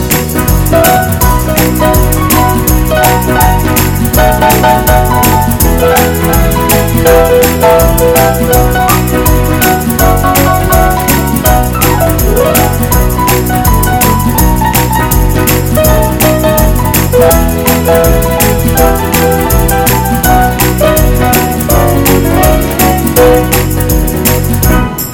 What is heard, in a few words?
Hot and Swinging